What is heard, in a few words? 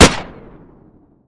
beretta fire; weapons